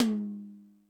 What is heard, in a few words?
Toms 1, drum machine